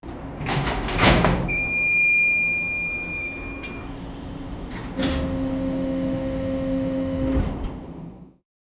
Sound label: a subway door opening